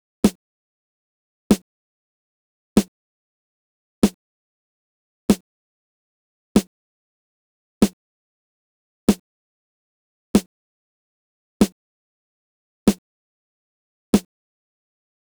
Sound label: Sirens